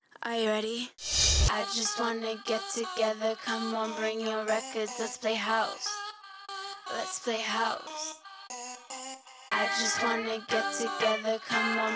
free vocal; ipadpro

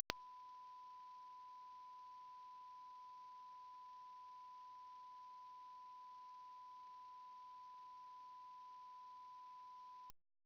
difference sine

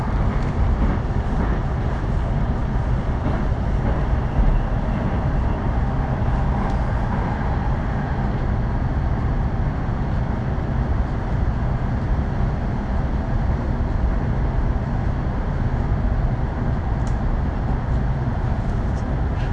sound leak

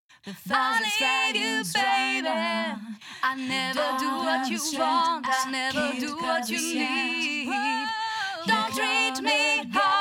Pure Rock Vo Hi

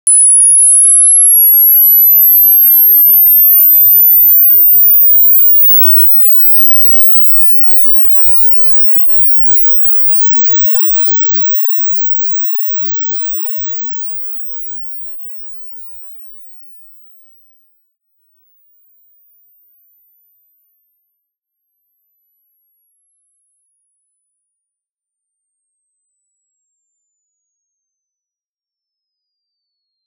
10khz 40khz

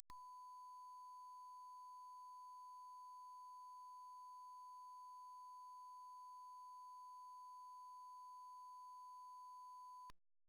sine sbc

